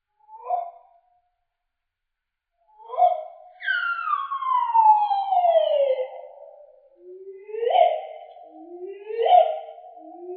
solitary bird song; ambience; dfcaverns

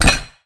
heavy boots

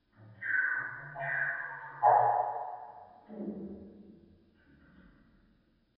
bird budgie song; dfcaverns; df ambience

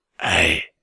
mobs yeti damage; server-nalc

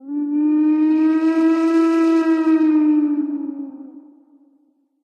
howl; mobs; mobs redo

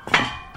metal footstep

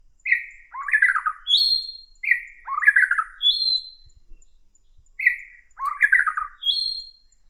jungle bird; dfcaverns; df ambience

